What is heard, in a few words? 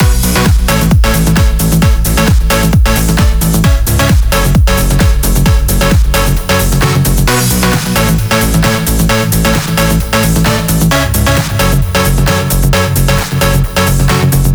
132BPM Elemental Mix